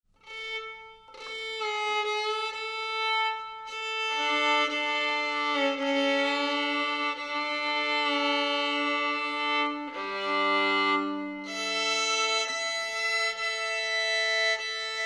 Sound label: Violin Tuning, Violin Second Chord, Tuning Chord; Neon Genesis Evangelion; Evangelion S2 Works